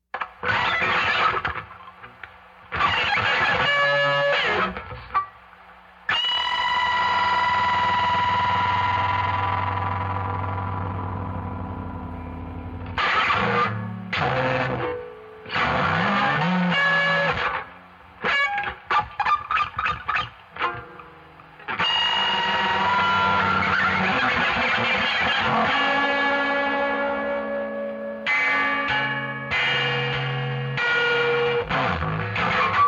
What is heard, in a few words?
Tap Gtr; Knitting Factory Works